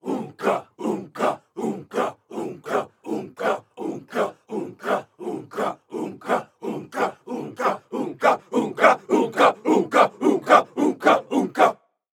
crowd tribal unka unka chant

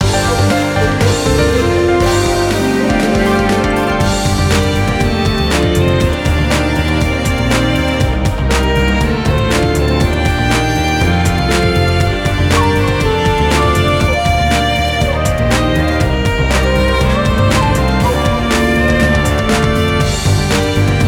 Battle Victory; Naruto Shippuden: Ultimate Ninja Storm 4

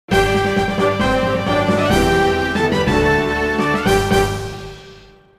Evacuation Success; atlantis the lost empire 2001 playstation

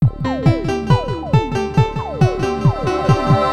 SHOOT; Mario Artist; Polygon Studio; N64; Sound Bomber

